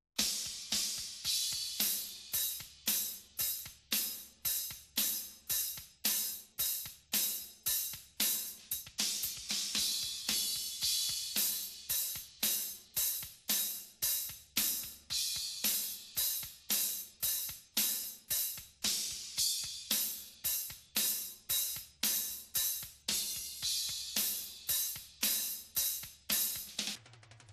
Cymbals; multitrack

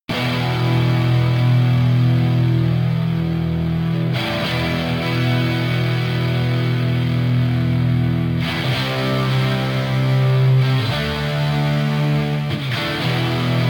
rhythm

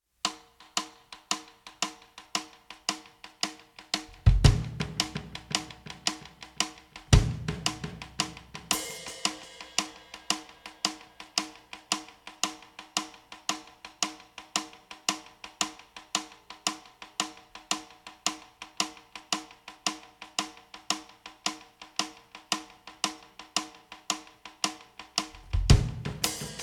drums

